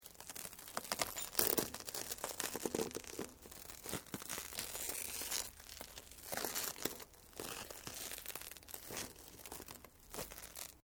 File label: GLASS LAMINATED TWISTING; Material components for constructing more complex sounds; Materials